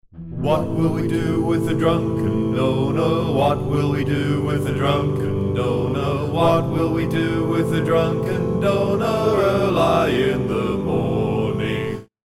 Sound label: Drunken Donor Jingle